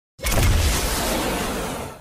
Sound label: omnitrix transform